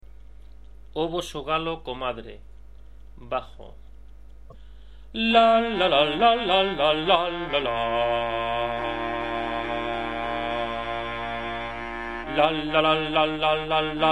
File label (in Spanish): Bajo voz; O voso galo comadre